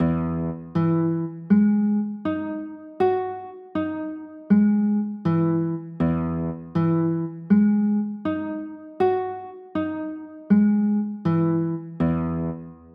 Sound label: e maj9; chords